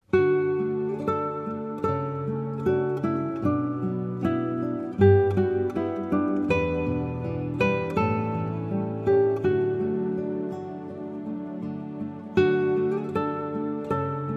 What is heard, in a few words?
acoustic praise, instrumental